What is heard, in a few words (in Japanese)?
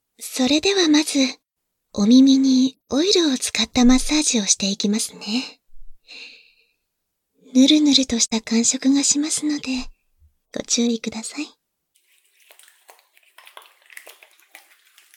耳オイルマッサージ+泡洗浄; 簡易モノラル編集 効果音あり